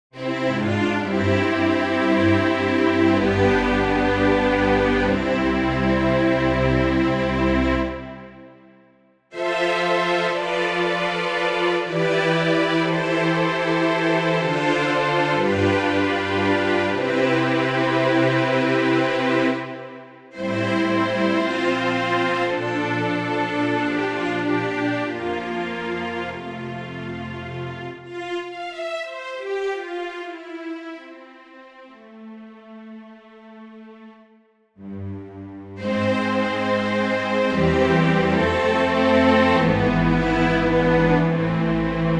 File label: sympho strings